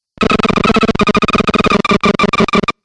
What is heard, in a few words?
Glitch Angry Clipped Beeps